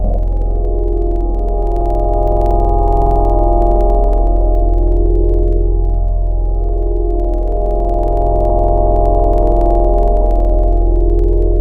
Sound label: Organ E; Synthetic's Serum Collection; Surge Sounds - ASTRO - Serum Wavetables